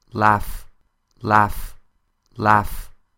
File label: laugh